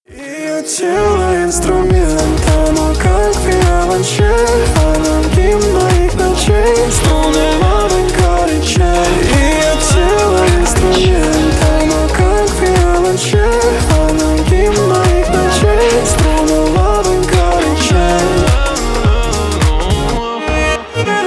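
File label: cello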